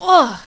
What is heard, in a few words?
pain, male